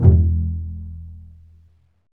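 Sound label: strings, contrabasses pizz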